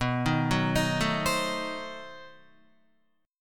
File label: B 7th Flat 9th B Guitar; B7b9 arpeggiated; 6,6,6,8,9,8; JGuitar